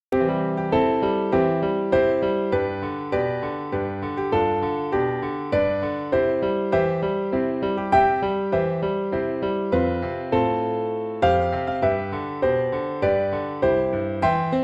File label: Happy Birthday C Major alberti bass